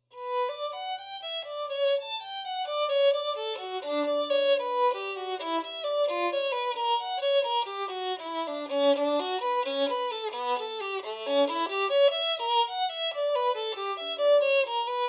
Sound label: Bach binaural on Strad1721 calculated